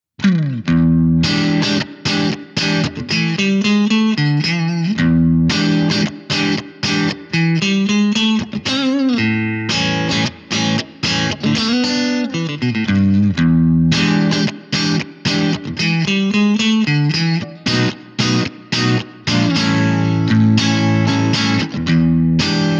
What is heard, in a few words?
breakup; jensentone